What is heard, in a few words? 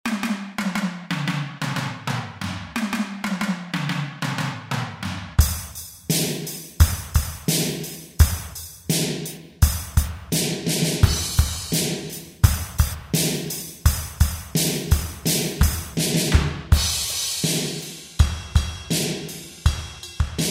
Castle Drums, Kurzweil K2000; Kurzweil K2000 V3